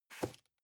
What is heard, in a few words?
cardboard box medium place slide soft; objekte